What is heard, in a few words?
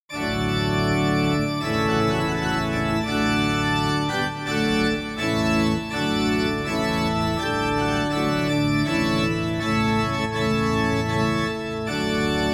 trumpet tune